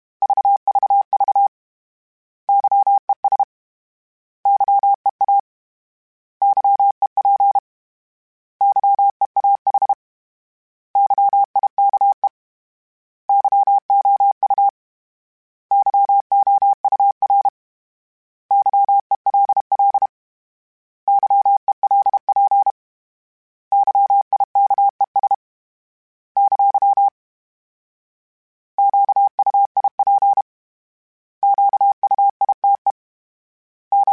Morse Code, CW Code Groups; HamRadio

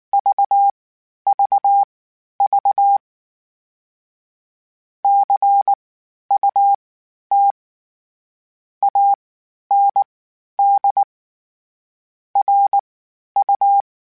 Word Pairs, Morse Code; Mixed; HamRadio

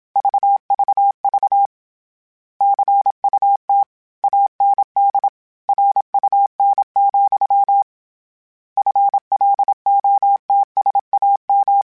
Word Pairs, Morse Code; Mixed; HamRadio

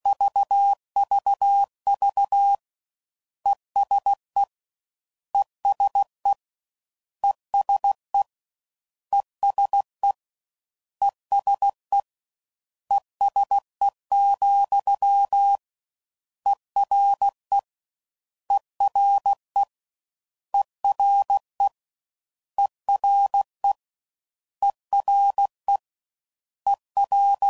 ESE ERE, CW Code Groups 15WPM Suffixes; Morse Code

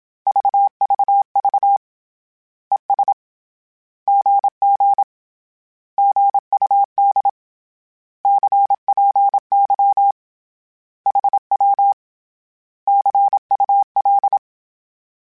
Words, Morse Code; HamRadio

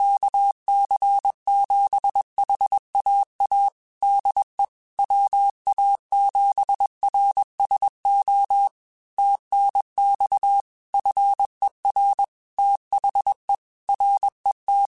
Morse Code, QSOs

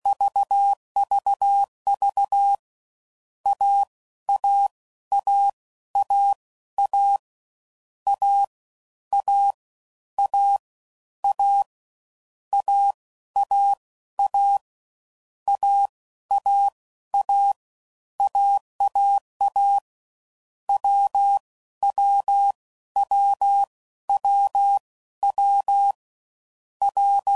CW Code Groups 15WPM, Difficult Combos; HamRadio; Morse Code; Mixed; Combinations

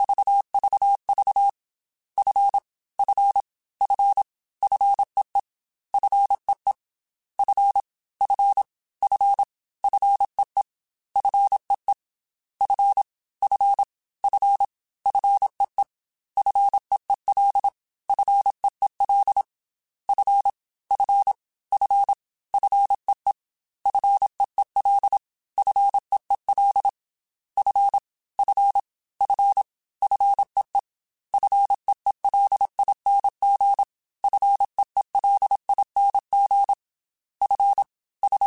Single F Prefixes 25, Morse Code; HamRadio; CW Code Groups; Mixed

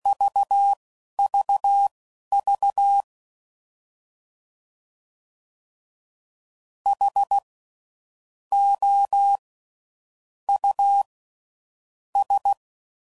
CW Code Groups 10WPM; HamRadio; Morse Code; Mixed; Suffixes

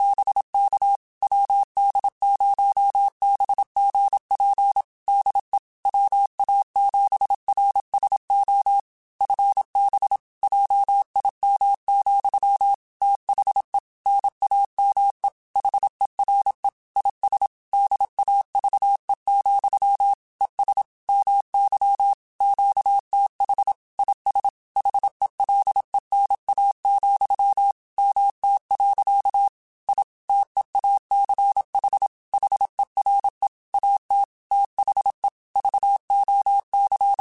Morse Code, QSOs; HamRadio; CW Code Groups; WD0FBT

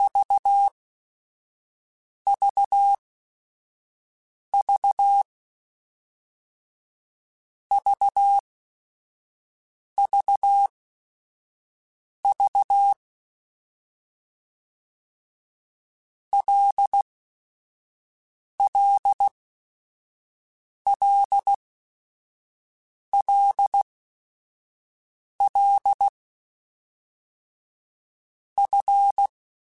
LFCYQ, Morse Code; CW Code Groups; Basic Letter Groups Collective7; HamRadio; Basic Sets

